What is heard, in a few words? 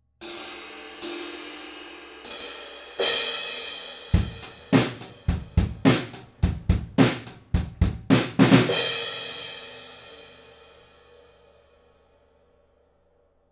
sE 8 Omni Drums Front Of Kit